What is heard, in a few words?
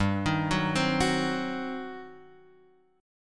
G Major 7th Suspended 4th Sharp 5th, Guitar, Open F, GM7sus4#5 chord; GM7sus4#5 arpeggiated; x,2,3,1,3,1